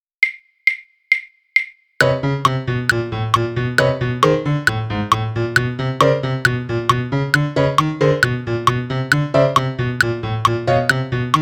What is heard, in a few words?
piano click; puppy